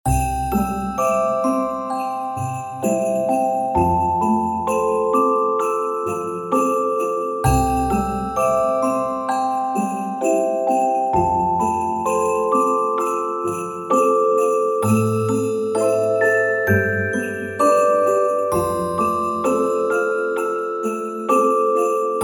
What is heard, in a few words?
Christmas Eve No Clock Ticking Loop